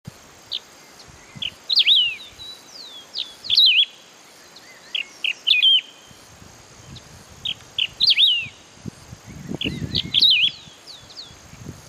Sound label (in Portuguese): canto do canario do campo